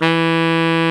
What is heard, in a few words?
SAX E2; Best Service Alpha Dance vol.2